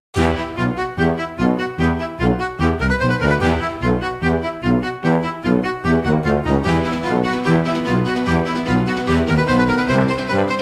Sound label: Tuba Tumble Parade, comical orchestra for slapstick gag scene